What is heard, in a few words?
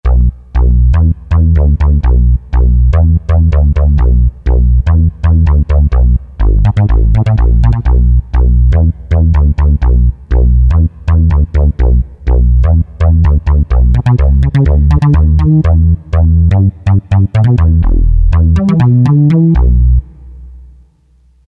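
bass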